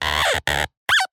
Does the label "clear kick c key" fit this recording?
No